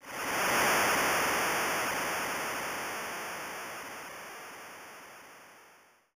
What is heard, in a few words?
Applause; Shin Megami Tensei 2; SNES